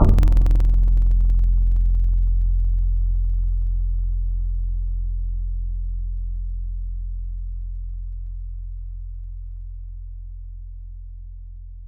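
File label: Guitar Acoustic Harmonics; Instruments; Synthetic's Serum Collection; Echo Sound Works Core Tables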